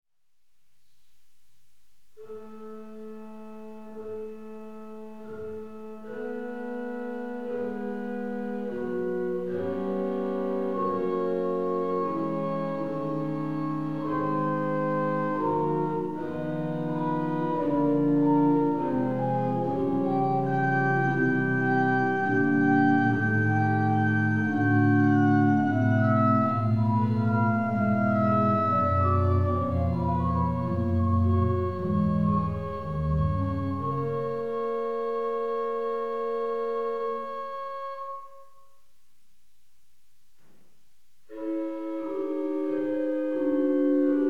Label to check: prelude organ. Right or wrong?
Right